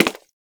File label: Human Loid Foley Step; Warframe